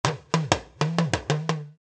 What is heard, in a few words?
Kalangu